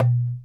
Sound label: Dumbek, World Percussion One Shots; Sony CS